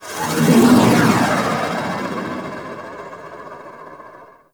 Shield Random; Warframe; Archwing; ArchwingShield

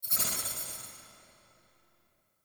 Fairy Weapon Shot Tail

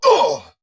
Pain; Warframe; Dax Ashigaru; Battle Barks

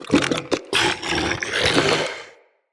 Shrimp Idle, Creatures; Vocals; Baliq Shrimp Glade

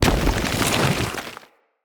Root Spider Unburrow, Foley; Animals

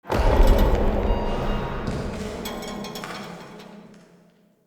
Cave Mine Distant Boom

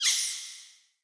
Vocal Single Squeak, Animals; Player; Player Floppet

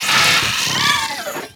Amalgam Moa Vocal Death

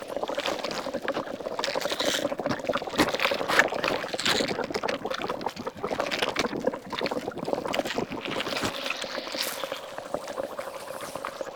Infested Destructable Cyst Loop; Ambience; Props; Warframe; Infested Eris

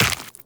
Run Flesh, Footsteps; Warframe; Tenno

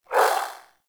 Swing, Weapons Melee; Warframe; Modular Melee

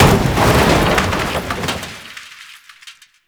Desk Destroy; Ambience; Gameplay; Warframe; Entrati

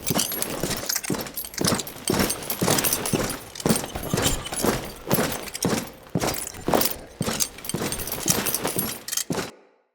Horse Step Gallop Loop Armour Foley; Mendicant Horse; Footsteps

